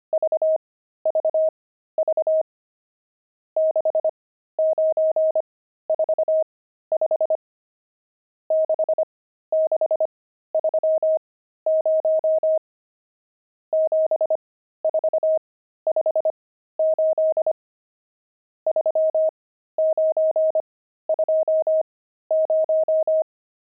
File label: pangram, intermediate pangrams files